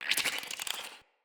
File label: Root Cave Creature Close; Ambience; Neathuns Root Cave